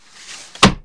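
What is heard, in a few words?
window close; Easy Phonics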